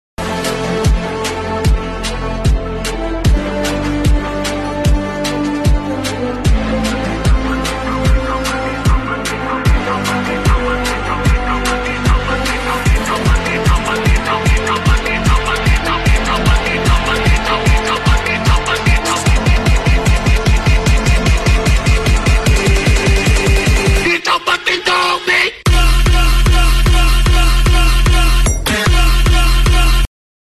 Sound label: remix v2 ringtone; pubg